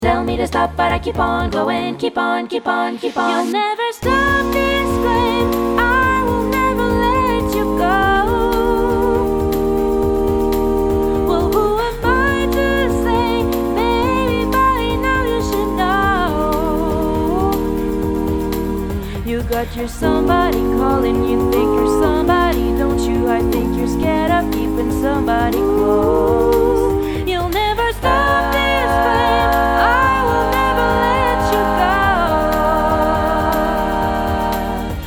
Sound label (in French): Tous, ensemble vocal pop rock; ensemble vocal pop rock de larquemuse